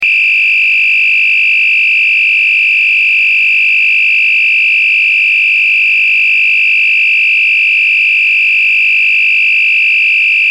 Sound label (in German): Ton